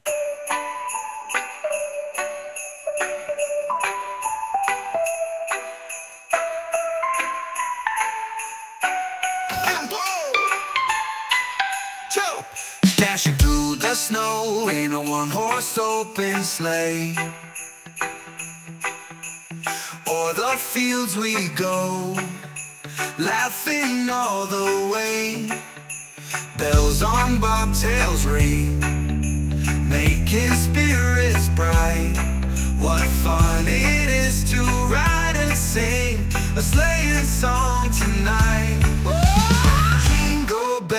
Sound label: Jingle Bells